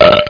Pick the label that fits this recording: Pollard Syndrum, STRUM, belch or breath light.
belch